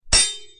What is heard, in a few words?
sword clash